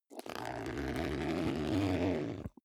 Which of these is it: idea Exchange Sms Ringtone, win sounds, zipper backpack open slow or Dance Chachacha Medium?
zipper backpack open slow